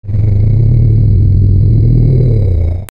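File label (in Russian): рык сторожевой собаки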